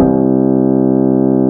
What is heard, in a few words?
Koto C3, Fairlight CMI IIe